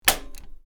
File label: Electric fan plastic button switch off; appliances